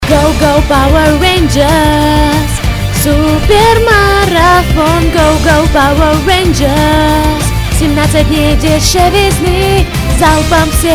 Screamo

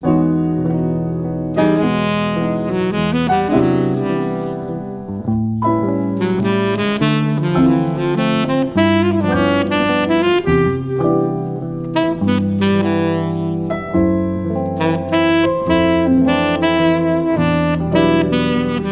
Cafe Music BGM

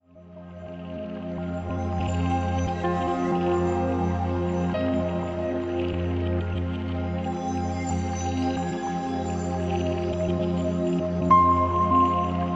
Ambient

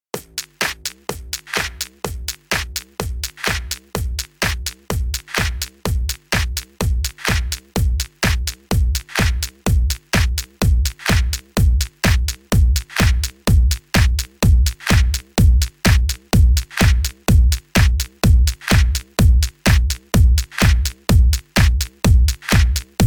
Processed Beats

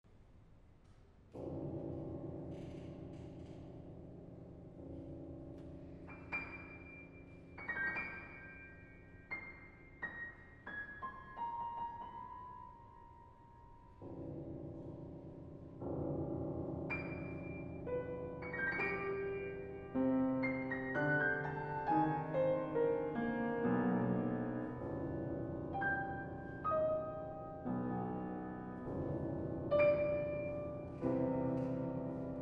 fortepiano

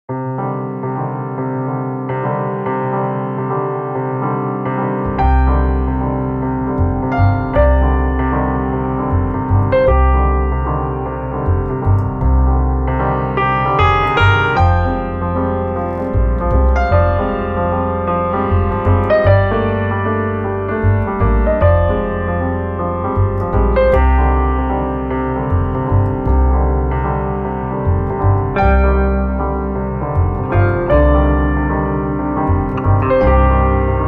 Noise Sextet

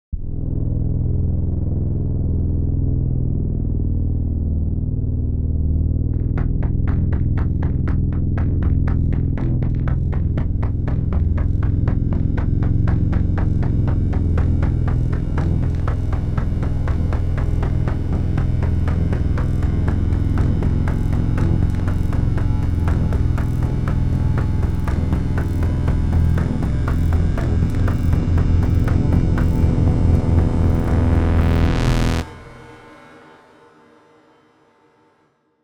Bass Rise

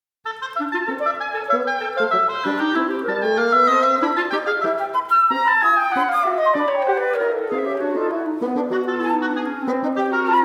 Divertimento for Wind Quintet; Babblement Scherzino Presto

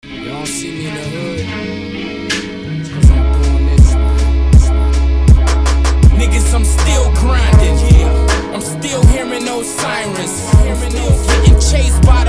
Beat

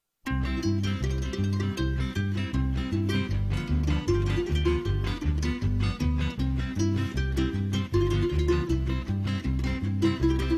March

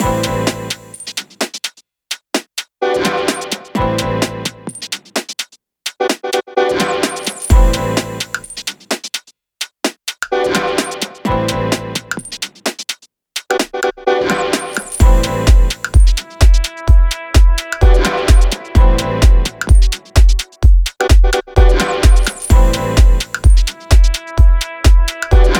Flow Drone

